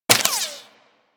Bullet Impact Debris Wood

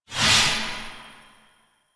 Lotus Hand Projectile; Warframe; Weapons; Duviri

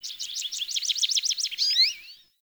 Tree Birch Bird; Trees

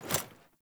Foley Action Armour Jump Land; Characters; Player; Deora Armour

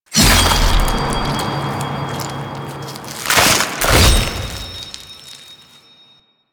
Stab; Archon Death; Warframe; The New War

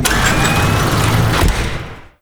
Camp Locker Door Open; Quests; Veilbreaker; Kahl Camp

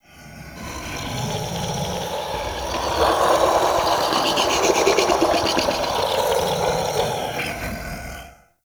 Male Vocal Idle; Characters; Enemy; Vocals; Mockeries

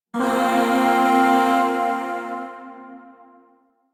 Shawzin Hirajoshi Chord K; Music; Chords; Warframe; Zariman; Void

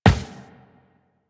Run Default, Footsteps; Warframe; Tenno

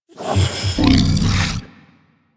Vocal Breathe Fast, critter; Warframe; Hunting Critters; Undazoa

